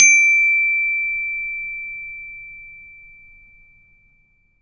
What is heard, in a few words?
ORCH BELLS; ILIO